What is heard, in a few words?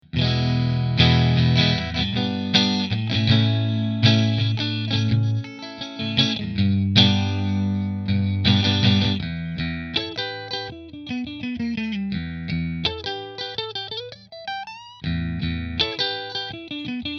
Italia Position 3 Fender